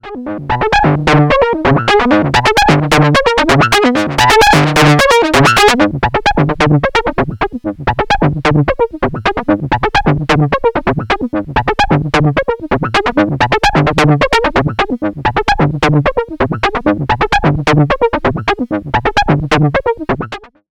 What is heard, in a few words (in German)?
ARP Avatar Patch sequenzierte VCO Cross Modulation